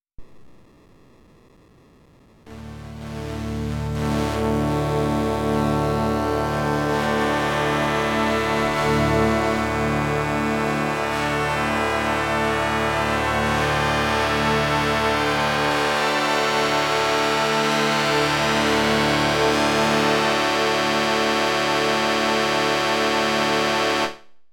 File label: Studio Electronics Quadnic Chord Sync1